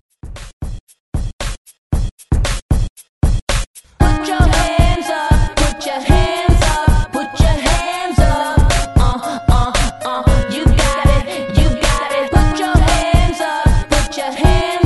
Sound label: Hype 115 Bpm; DJDOLLS; ReDrum